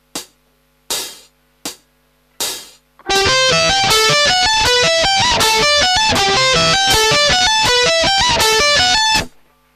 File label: advanced arpeg